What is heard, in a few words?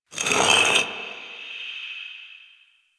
Stone Small Scratches; Warframe; Ambience; Entrati